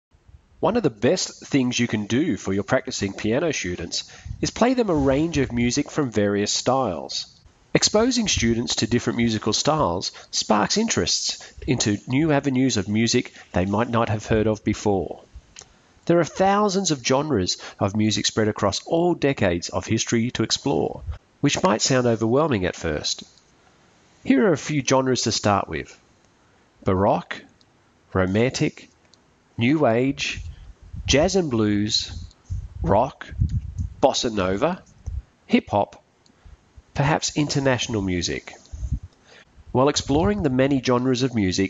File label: piano musical styles; piano students